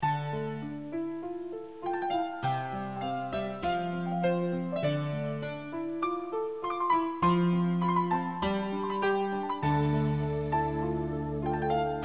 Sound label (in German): klavir